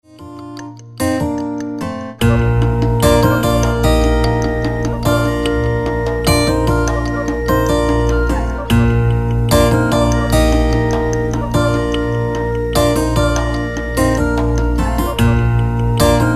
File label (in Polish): muzyka bez oplat